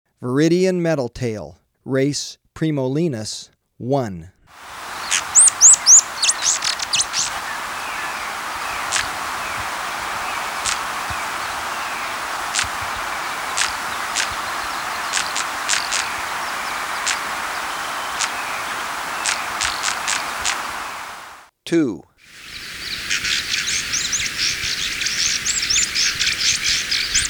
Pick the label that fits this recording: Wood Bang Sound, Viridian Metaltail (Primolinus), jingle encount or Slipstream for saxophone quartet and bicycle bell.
Viridian Metaltail (Primolinus)